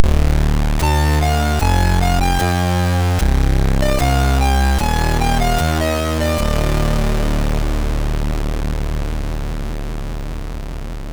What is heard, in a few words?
Jingles, MOS6581R2; Freeway 86